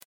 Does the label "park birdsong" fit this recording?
Yes